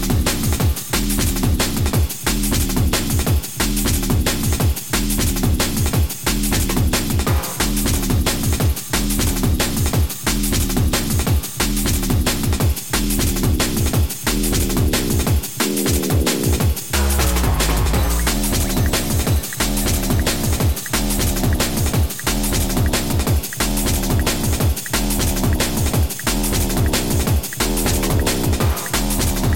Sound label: bass